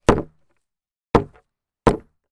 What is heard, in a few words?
industrial sledge hammer gently bash wood sleeper into position x3; zapsplat